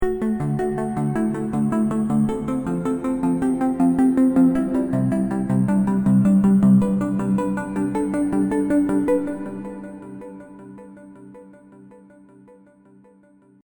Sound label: serum seqs arp; chiqui audio